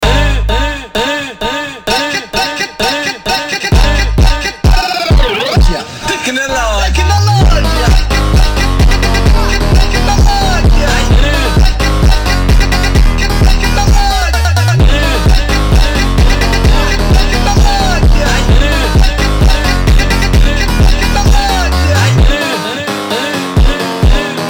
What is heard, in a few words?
funk